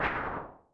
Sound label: weapon ranged; adarkroom